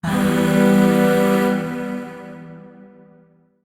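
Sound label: Void Shawzin Major Chord; Warframe; Zariman; Chords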